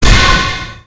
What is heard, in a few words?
effect shield